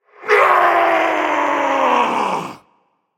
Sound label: battle cry; cerberus